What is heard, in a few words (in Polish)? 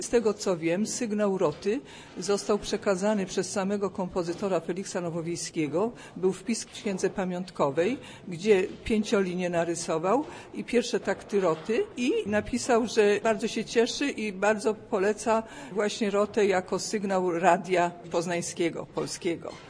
jingle komentuje